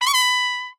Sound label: extra Spin Trumpet; willyshotchillies